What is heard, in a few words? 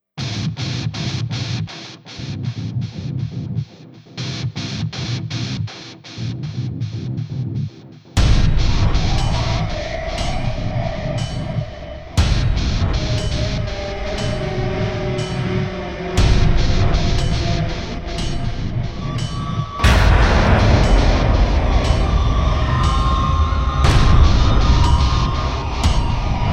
Intro Instrumental